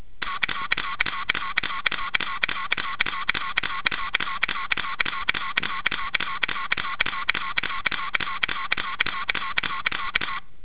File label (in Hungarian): csendes, Canon EOS 5D Mark II